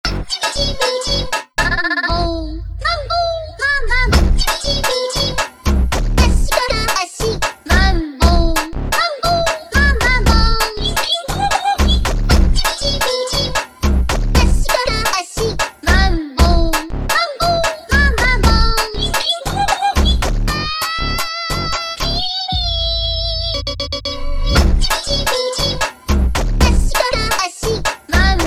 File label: Funk slowed